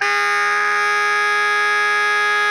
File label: SOPRANO G#3, Saxophones, GRWL; Greytsounds; Philip Wolfe David Crozier's Saxophones